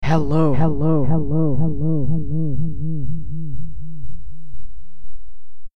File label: lpdelay; nyquist